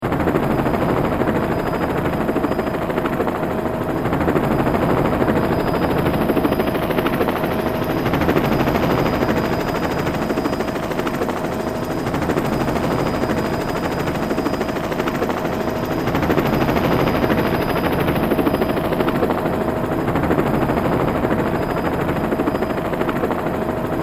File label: Helicopter Up Down (headphones)